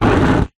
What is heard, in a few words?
nature fire flame air wind blow blast fast short